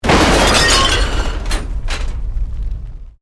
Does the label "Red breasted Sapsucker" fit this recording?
No